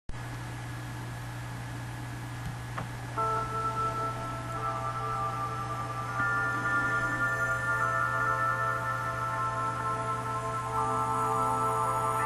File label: progressive relaxation music